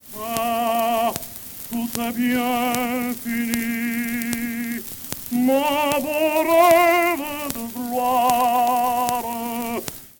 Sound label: click